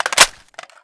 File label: clip in